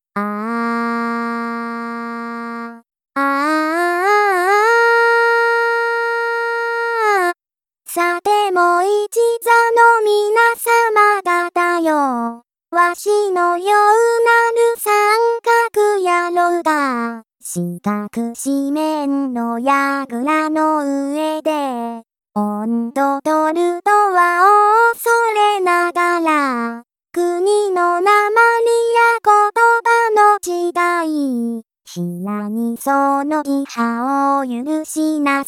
Yagi Bushi female

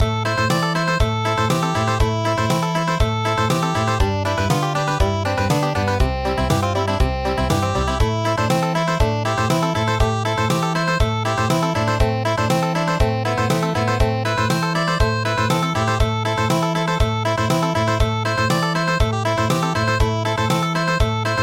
Country; Arohnee

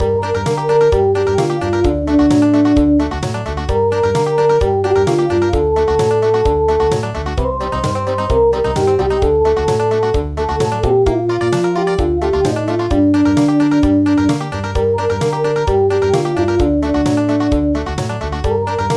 Country

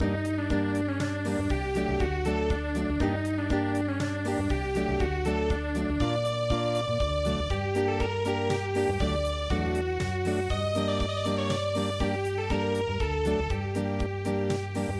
Unplugged; Lapp visa

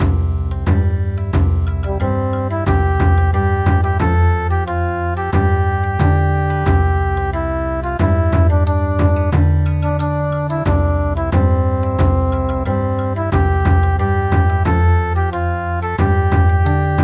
National Anthem Bon Odori; traditional; Thai

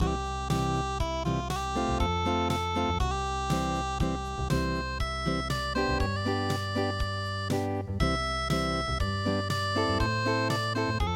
furusato haru unpluged

